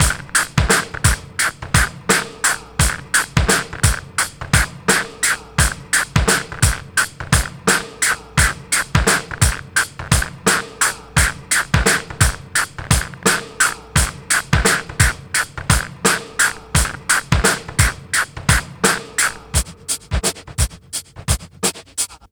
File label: 86 bpm; TRIBE VIBE; 90 s Sample CDs; Planet of the Breaks